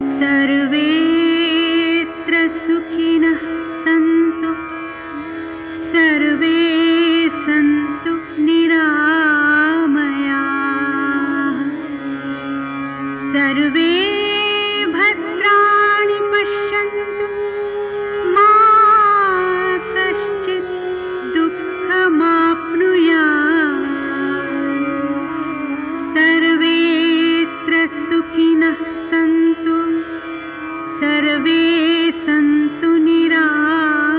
Sanskrit Version

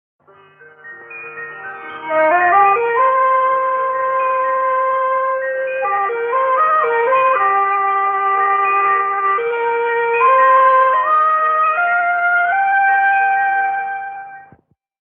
Emblem Music

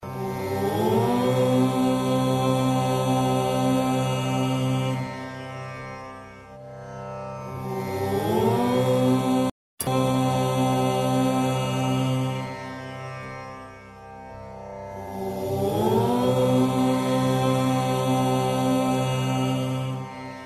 Om Chanting

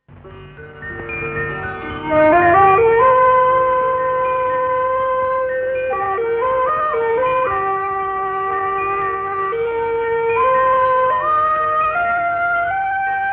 Emblem Music

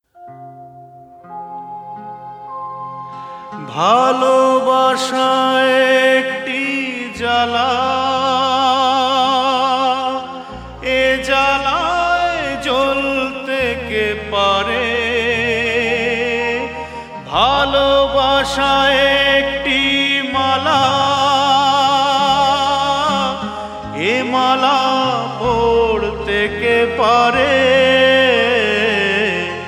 Sad Version

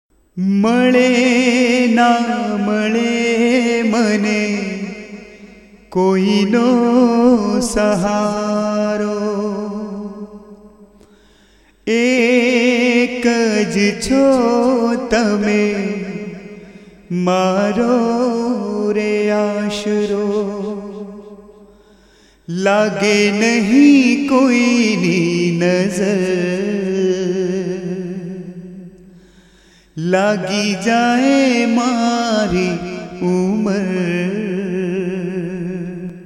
Sad Version

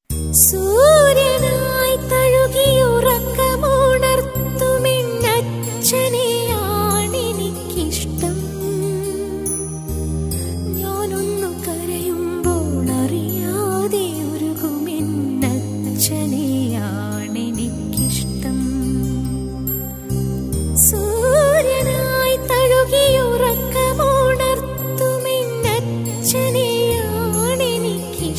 Female Version

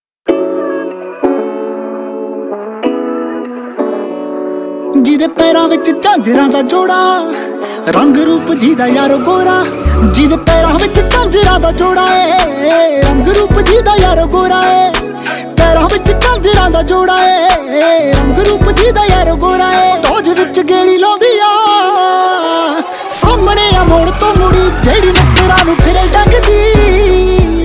Music

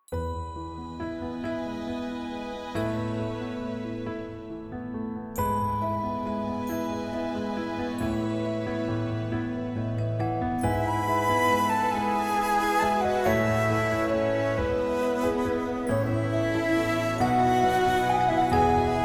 Instrumental

